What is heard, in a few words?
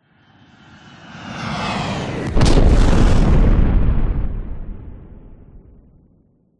airplane jet crash explode designed; vehicles